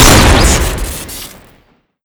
flintlock shoot crit; tf2

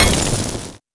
welder build; tf2

